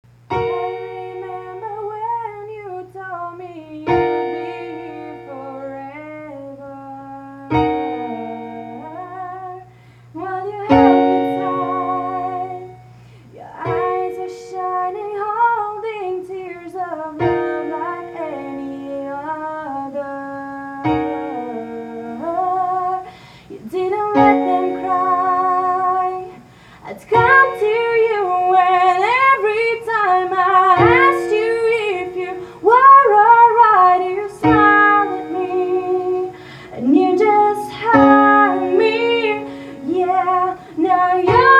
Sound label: piano; Practice session 7/25/07